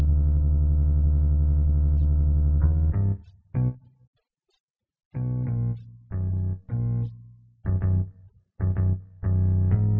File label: wdrums inst sim; SASSEC07